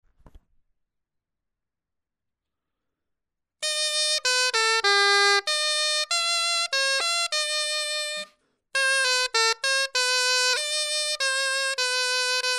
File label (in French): clarin etude